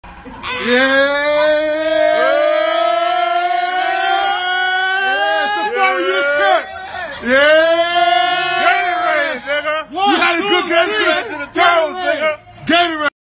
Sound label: yeeeaaah